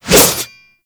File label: Light Glaive Whoosh; Warframe